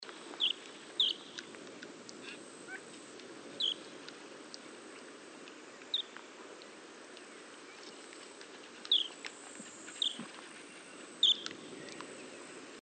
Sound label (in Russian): dlinnopalyy pesochnik golos